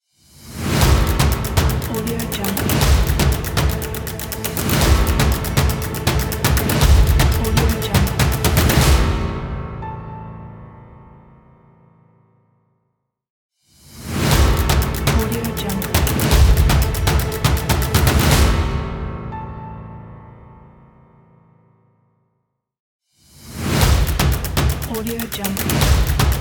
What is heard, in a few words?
epic logo